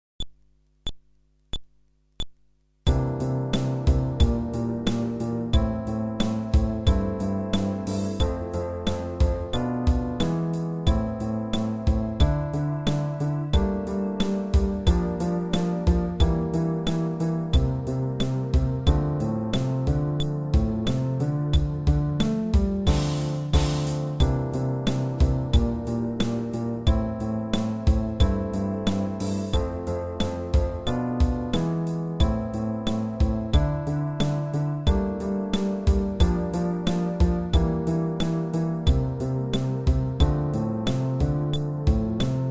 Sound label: Groove, fretless bass